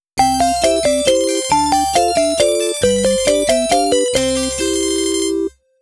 ゲームオーバー; メルヘンメイズ(AC)

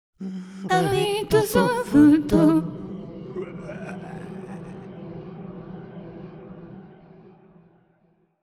ジングル ホラー; ハビットソフト・ジングル ホラーVer.; Gunstream Original Soundtrack

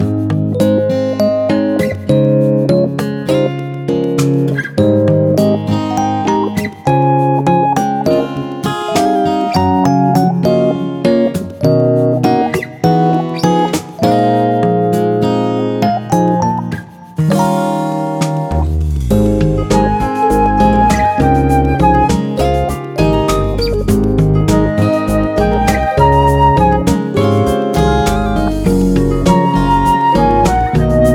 ＡＤＶ／ゆったり; rance x showdown windows gamerip 2018

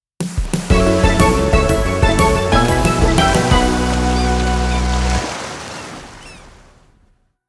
ゲームオーバー BGM; ver.maimai でらっくす Splash; maimai dx best album 2021

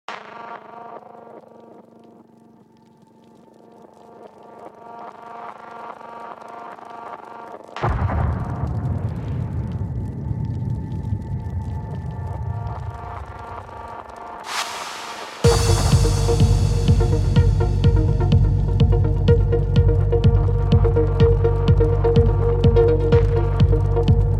火山ステージBGM Loop; Tower of the Approval; Crater Lake

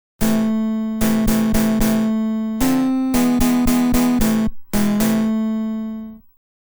ゲームオーバー; 地底大陸オルドーラ

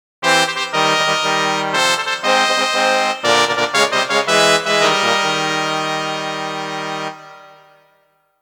ちょっと豪華なファンファーレ; Monster Farm 1 2 Complete Sound Collection

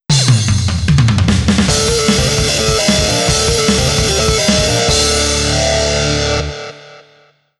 バトルスタートジングル; Custom Robo Battle Revolution

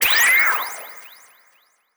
Kirakira; Se Solo Kirakira 03

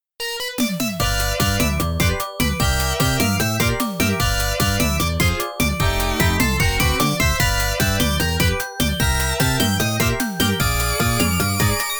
勝ちBGM; Face Off

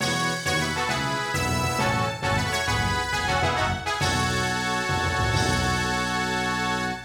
ファンファーレ; RPG Tsukuru 4; PS1

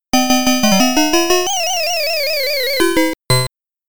ゲームオーバー・ミュージック; super pac man

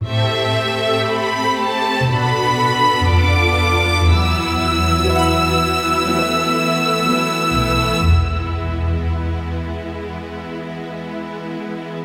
ゲームオーバー; Touhou Soujinengi V; PS Vita

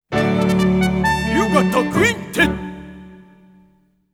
ミュージック・ジングル (フラット); You Gotta Quintet Songs; ユーガッタ・ミュージック・ジングル (フラット)

